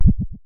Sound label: Synare Filter; Star Instruments Synare 3